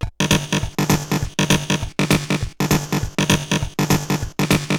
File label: Bent RX17 (100BPM), cyborg beats; musicradar